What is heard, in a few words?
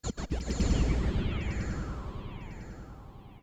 FX Industrial 140